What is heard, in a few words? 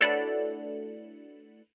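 Samsung Galaxy S22 ringtone notification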